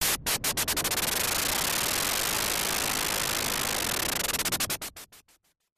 spin

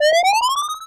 power up

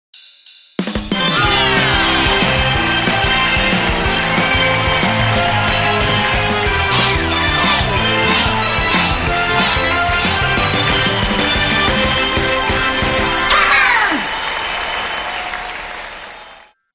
lets enjoy, jingle

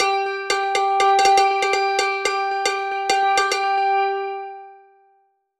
stainless bottle rolling down

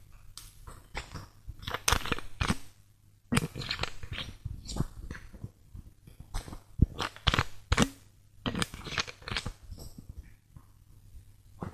open and shut

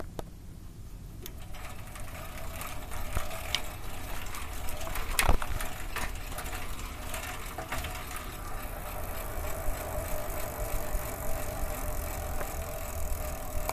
bicycle

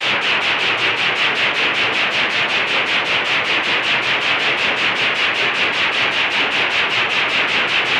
steam locomotive

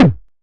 punch

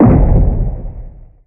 kick

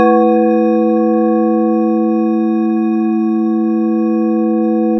shine

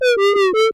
dizzy

